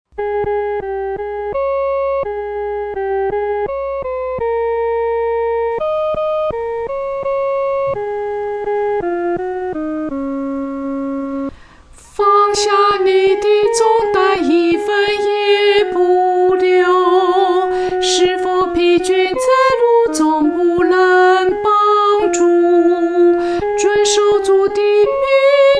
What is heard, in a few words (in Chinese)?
独唱 第一声